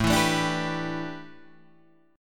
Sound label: A 7th Suspended 2nd Suspended 4th Guitar Half Step Down